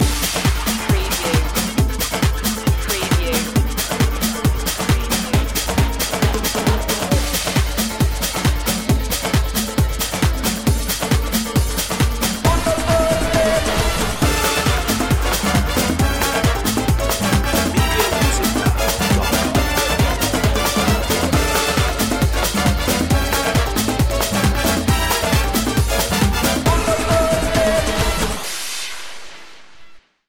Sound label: latin carnivale